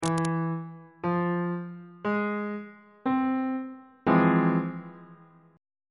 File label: e sharp minor major 7th 3rd inversion on bass clef